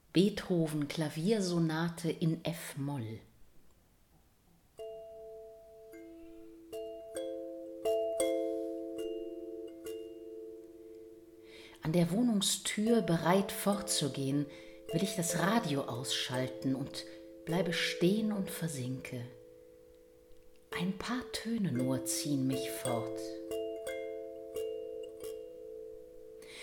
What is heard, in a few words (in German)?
Klaviersonate in f-moll; Beethoven